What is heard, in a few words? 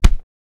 Punch; Adobe; after effect; Action Essentials 2; Bonus Sound FX